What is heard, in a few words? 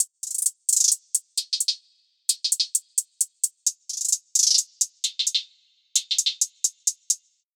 HAT LOOP